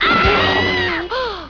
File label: ahhhh